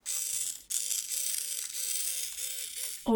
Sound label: servo robot mini toy spider servos; soundmorph